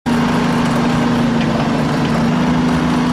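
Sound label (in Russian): некорректная работа двигателя автоцистерны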